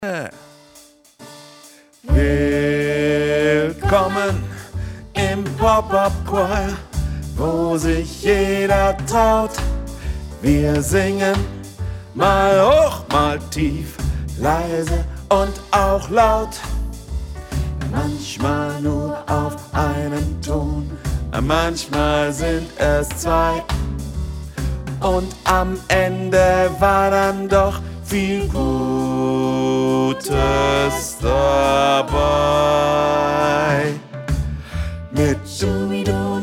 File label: Bass